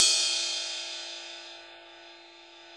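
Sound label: Cymbal Ride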